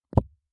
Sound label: Plunger Pop